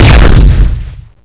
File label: zap, lasergun